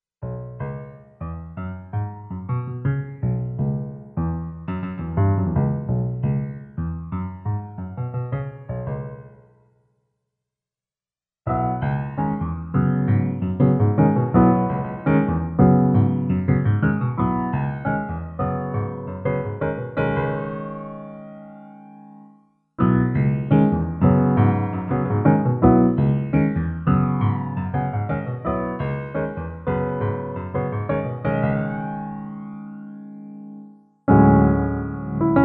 rock on slow flange

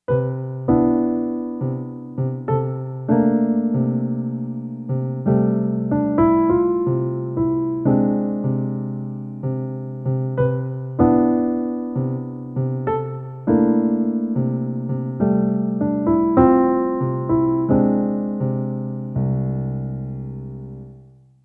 quiet thing in c major

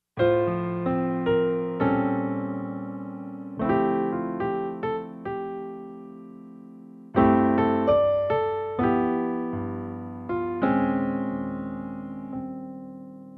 ballad in many keys

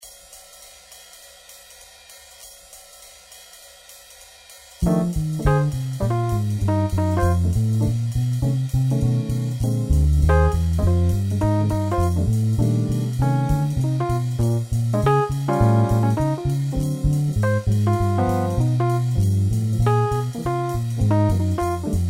blues in f

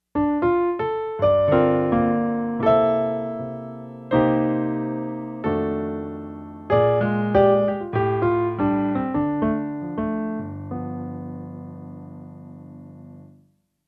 blues in d minor intro

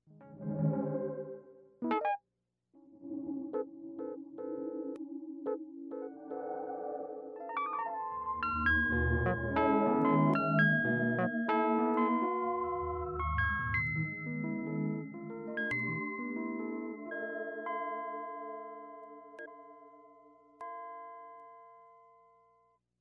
random accompaniment

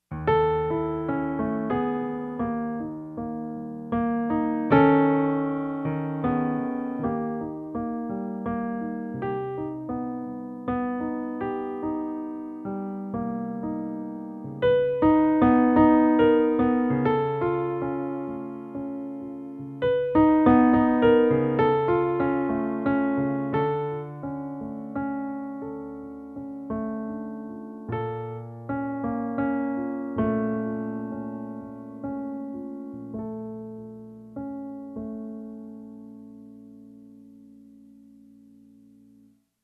romantic phrase

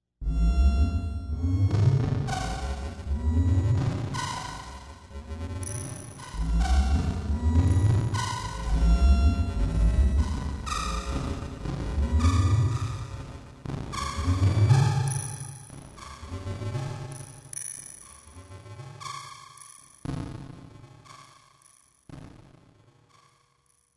red alert

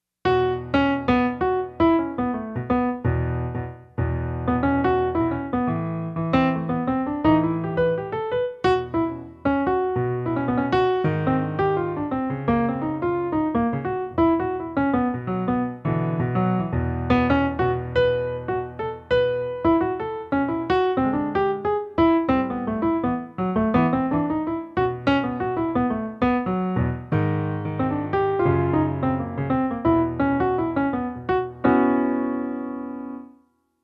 blues jam changes

